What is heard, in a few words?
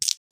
wood cracking; wood metal SFX